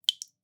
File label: Drips